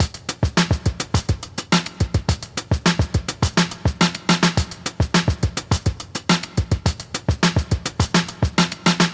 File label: Poping Hip Hop Beat